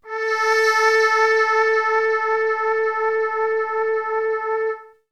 Synth; ZERO G - Datafile DISC 1

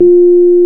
PNO, Rhodes Mk.V; Keyboards of The 60's and 70's

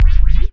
hit, brass

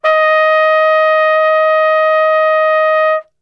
D#3, Brass; Best Service; Brass Super Section

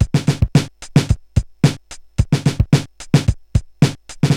Korg MR-16 (110BPM), beats; musicradar; cyborg beats

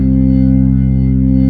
B3 C 1; Best Service; Advanced Media Trax Modern Composer; B3 SMALL2

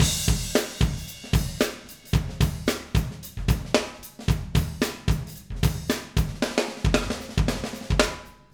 Acoustic Live Drum; Platinum Audiolab

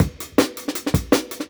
160, Jungle Breaks; Original Jungle Breaks

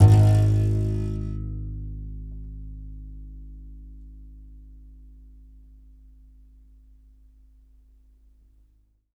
C. Bass Balalaika, Plucked Strings

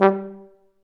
F TUBA, Solo Brass, Tuba stacc

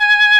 VIB FLUTE C3; ORCHSTR; AKAI S-Series CD-ROM Sound Library VOL-2

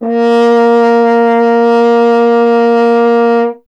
F. Horn; Miroslav Vitous; Solo Instruments VOL-2

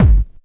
bass drum deep, techno trance drums

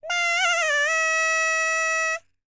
BULGARIAN, World Voices, BALKAN; ILIO; Vocal Planet

